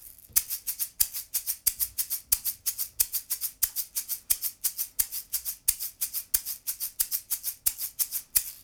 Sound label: shaker; tbone rb500